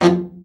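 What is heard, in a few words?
baritone, BARITON SAX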